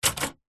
office typewriter single button key press typebar strike